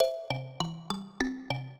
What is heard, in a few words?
trio, mbira; Mozart